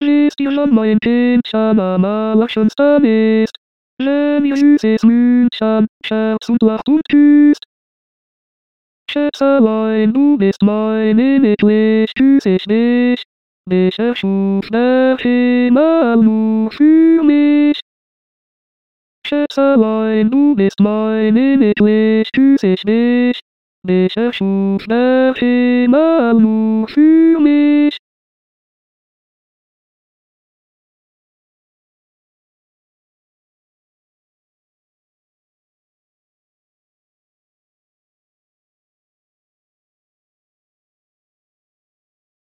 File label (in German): Zigeunerlieder ténor